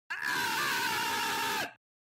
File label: scream; Asgore; Goal Sound; Blue Lock Rivals; roblox